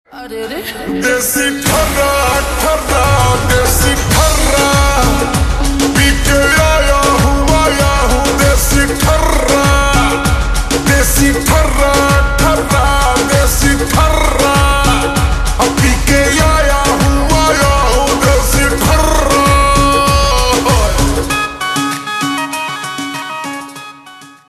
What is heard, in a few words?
Bhojpuri Slowed Reverb Ringtone